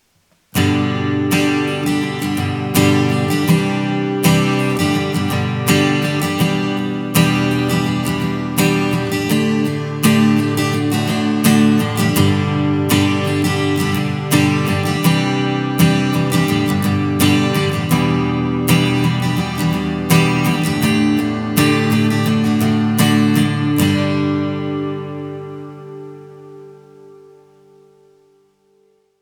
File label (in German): universal audio bock 187 akustikgitarre comp fx